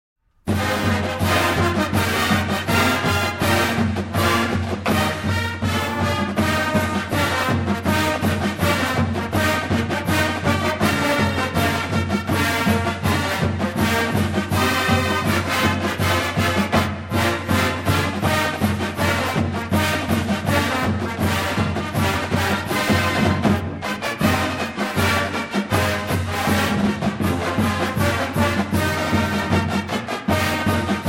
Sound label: fight song